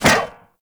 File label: Zipline Brake Foley Contact; Gadgets; Parkour Zipline